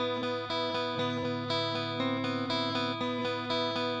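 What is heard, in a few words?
Moody Clean Guitar Arpeggio; Guitars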